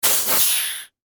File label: Pneumatic Expel, Scifi, Air, Burst; Science, Fiction; Spacecraft Sound Effects